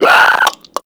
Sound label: Infested Runner Hear Sound; Warframe; Runners